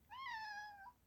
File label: Begging Meow